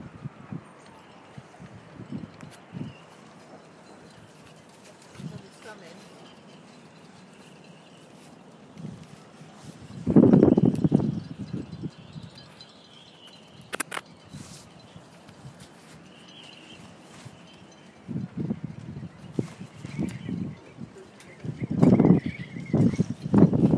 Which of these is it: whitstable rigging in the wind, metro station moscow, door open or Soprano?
whitstable rigging in the wind